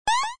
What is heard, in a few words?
Jump item; Insidia